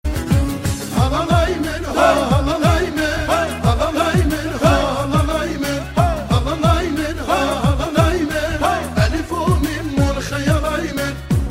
Govend

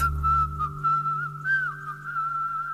Message Tone; LIC

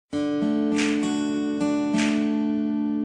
Clap Music

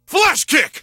Flash Kick

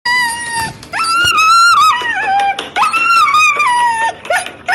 Dog voice

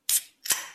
Open Beer

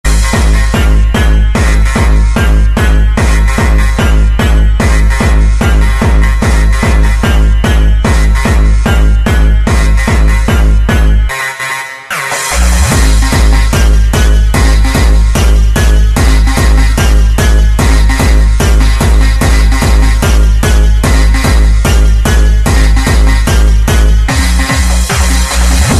Jumpstyle

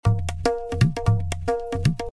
Drum play

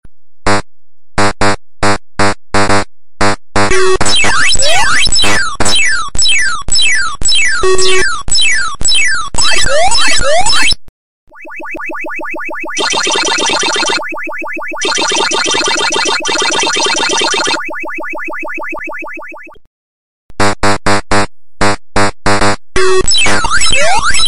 Clock Alarm; Pacman

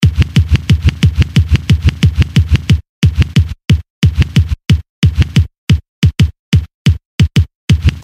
Dum Dum Sms

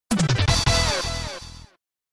Win

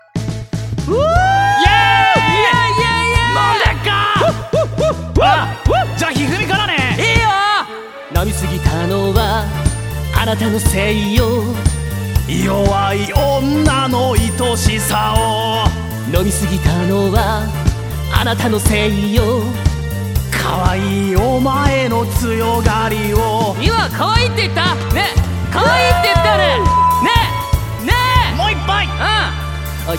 Ringtone; HifumiDoppo

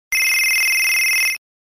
Nextel Ringer

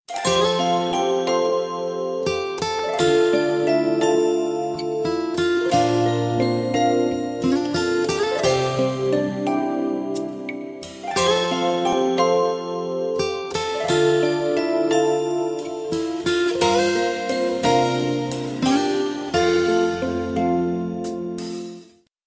Cutest Ever Melody

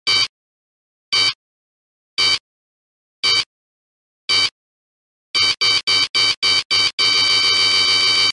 Missile; Ace Combat

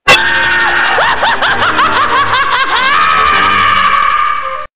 Macinal Laughter

